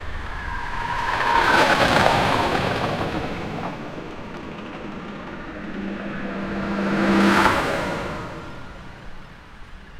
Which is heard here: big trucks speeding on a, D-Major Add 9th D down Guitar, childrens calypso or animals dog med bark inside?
big trucks speeding on a